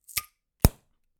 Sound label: opening a beer bottle